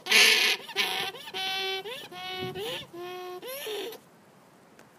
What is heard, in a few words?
Alarm, alpacas